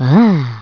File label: AAH; Spraak